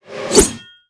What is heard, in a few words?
ATTACK